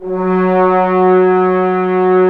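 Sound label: brass trombones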